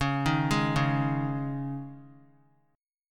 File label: C sharp Suspended 2nd, C sharp; C#sus2; arpeggiated; JGuitar